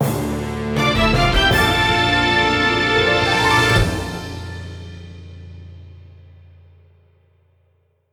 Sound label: GameOver; SSB4 Wii U